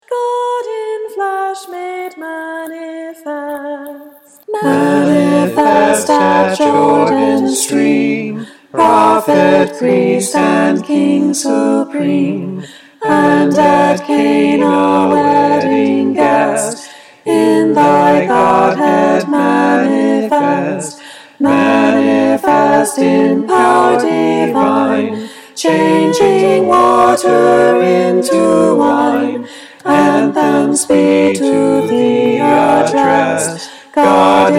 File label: Tenor; Songs of Thankfulness